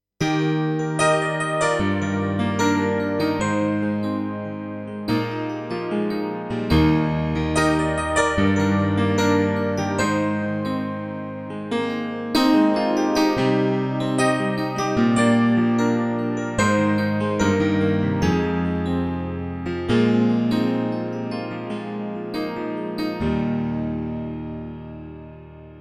dx piano mix; kurzweil artis

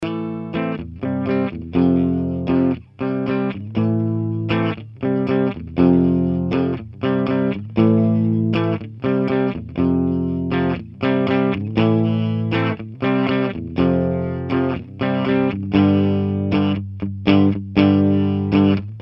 rhythm left miced clean amp contour right

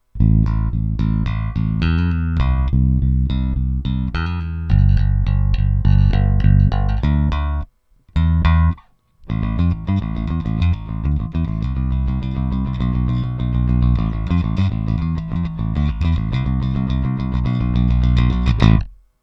equalizer neutral; trace elliot ELF

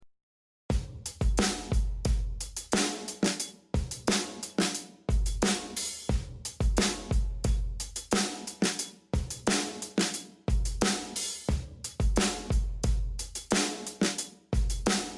drums; artsacoustic cl series

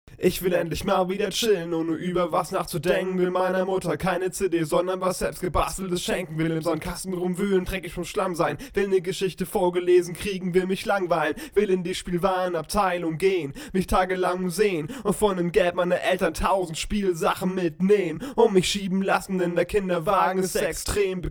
rap normal; vocalign pro

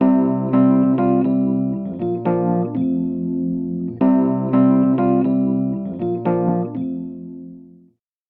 e-piano; softube abbey road studios brilliance pack

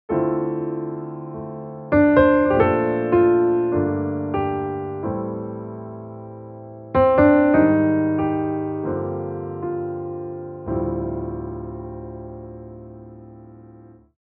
grand piano jazzish; xln addictive keys

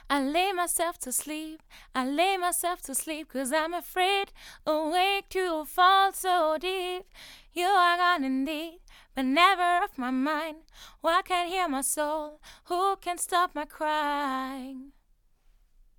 vocals; mxl 9090wrm

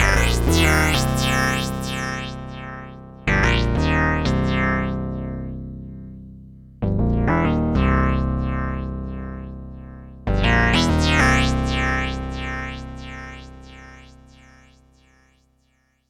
synbass; casio xw-g1 testbericht

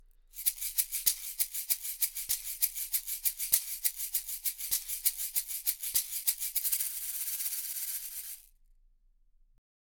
shaker; seelectronic se2200a

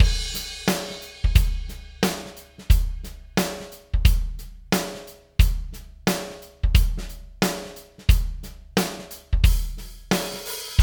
chameleon labs 7602 mkii drums wet

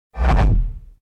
whoosh master more control

